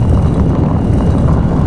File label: rolling boulder loop; weapons; dota; earth spirit